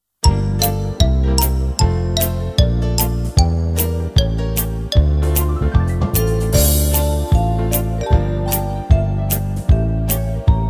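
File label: backing track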